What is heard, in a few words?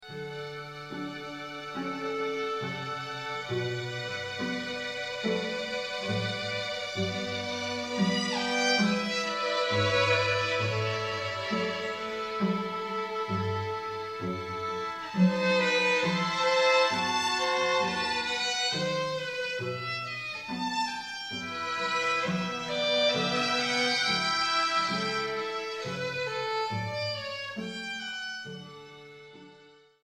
strings